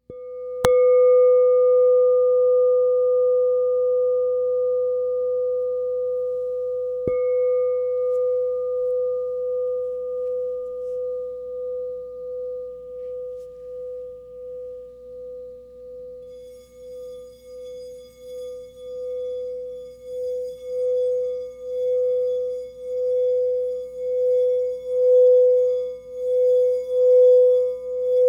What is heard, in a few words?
Crystal Tones Singing Bowls